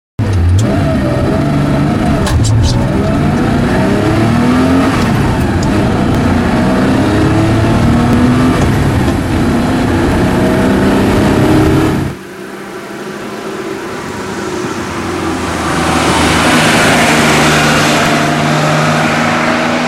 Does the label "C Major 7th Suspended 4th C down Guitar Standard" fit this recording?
No